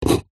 wood scrape sharp knife score single

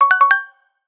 app alert tone

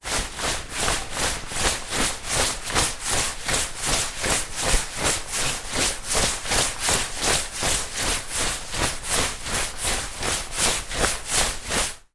foley raincoat movements running jogging

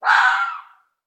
animals fox red mating call single; sound effects one

